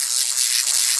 lightning loop; MagicArsenal

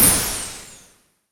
Ice Magic Light Impact; Cielonos

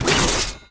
Sword Strike; Continentis

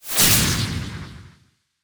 Electric Magic Lightning Zap; RPG3; Cielonos; Wwise

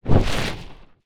Fire Magic Flame Thrower End; Cielonos

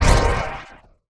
shadow impact; MagicArsenal

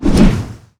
Weapon Swing Hammer Slow Heavy; Cielonos; Wwise

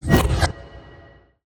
Spear SKILL cast; Cielonos

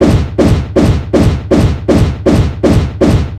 Swirl Attack Skill Weapon; Cielonos; Wwise

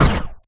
explosion laser; Cielonos

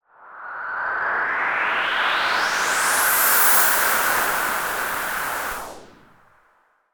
ENERGY Vortex; Cielonos

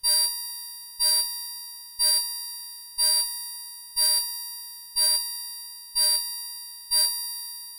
COMPUTER Alarm; Cielonos

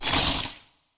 Ice Magic Misc Attack Missed; Cielonos

